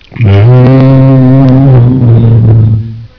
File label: moo; hall of moos